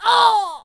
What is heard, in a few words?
Pirate Queen Attack Vocals